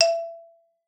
E4, xylophone; Xsample VOL-4

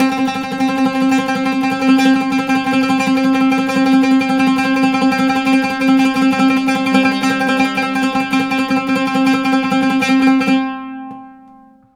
LUTH 2 C4, Arabian Traditions; USB Soundscan vol.43; AKAI

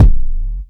dry kicks; Quasimidi Rave-o-lution 309

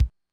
Kick, mxr; classic machines

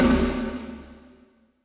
phreaky snare; Trance; Drums; Snares; Trance Explosion Vol1

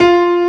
AMB PIANO

